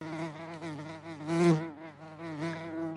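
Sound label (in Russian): звук жужжания пчелы короткий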